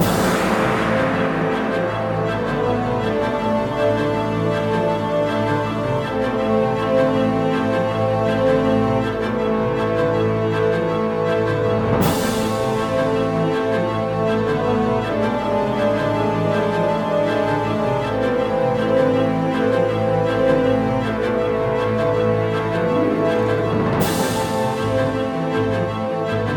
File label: menu music; MegaGlest